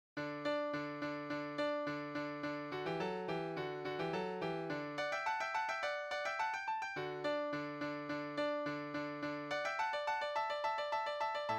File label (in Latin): tutti; Vivaldi Gloria; Quomniam Tu Solus Sanctus; kirkkokuoro